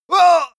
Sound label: male hit